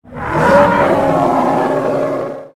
Frozen Mammoth Attack

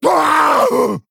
Male Fel Orc Death

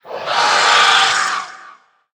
Violet spellwing Attack

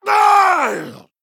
Male Troll Death

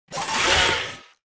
Clean Mech Small Attack

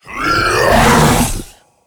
aberration attack

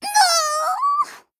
Gnome Emote Death; Pip the Potent

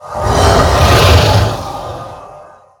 Arcane Giant

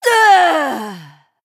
Female Human Death; Grace

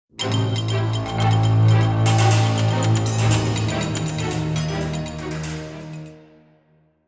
Stinger

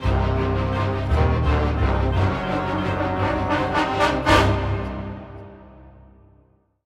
Play Stinger; Hooktusk

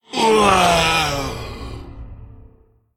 Male Elemental, Death

